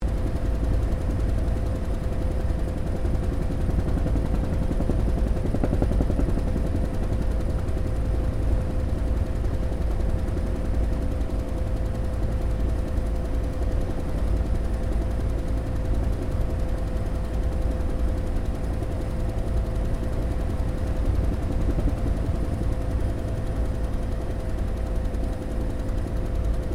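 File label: helicopter Bell UH1 Iroquois idle interior; RAN UH-1 Iroguios; Helicopters (Sound Effects:Vehicles)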